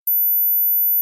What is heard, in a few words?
Short High Pitch